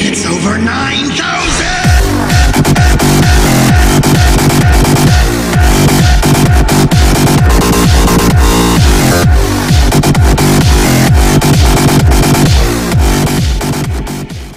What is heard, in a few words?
electro; roundsound